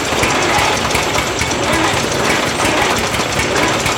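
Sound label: generator damage loop; Explosions; modern warfare 2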